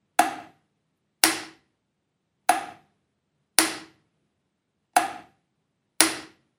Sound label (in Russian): zvuk knopki